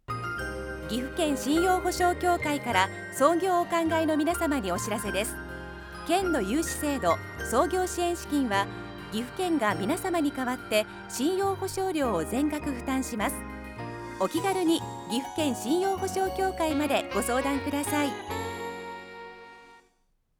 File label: radio